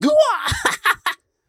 Lunge

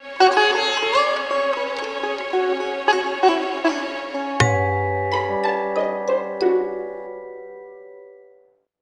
Bari Sax

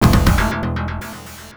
Bonus Stage Jingle; Art of Fighting Ryuuko no Ken; Genesis

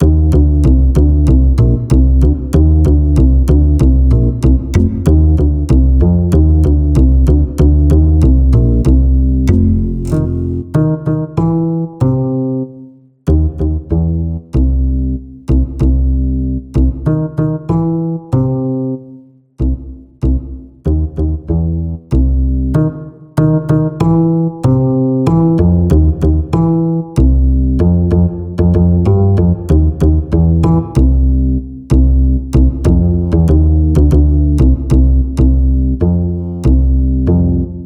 Bass

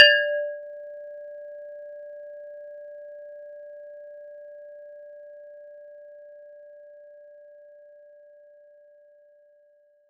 DX7 Strike

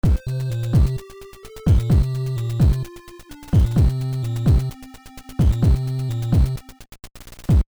Logo (NES Version); Ocean; Darkman

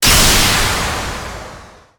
Laser gun

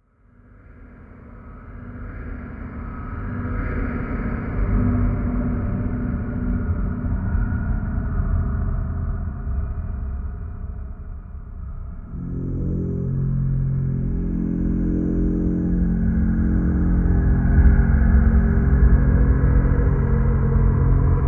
Dark Ambient; kholat gamerip 2015

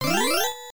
Item Taken; pippols msx scc i

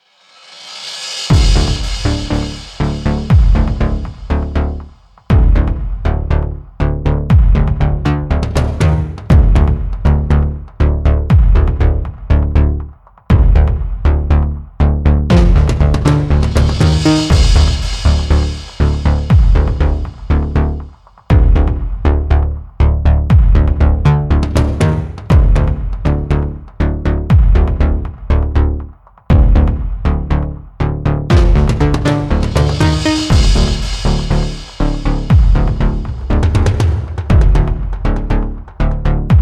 Music Pulse Loop 120BPM; gamerip; Zombie Army Trilogy; PS4

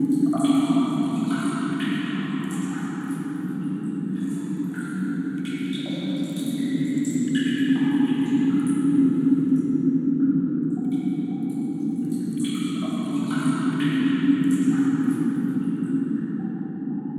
Cave; Poker Quest Swords and Spades

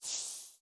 soul pickup; gamerip; Hollow Knight

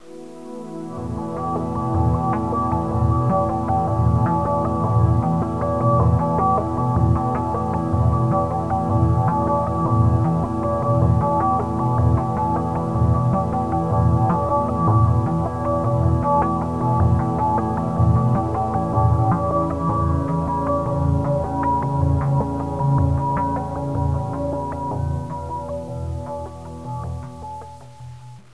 Pad; Great Eagle 2

